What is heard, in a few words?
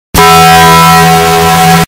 bass boosted; taco bell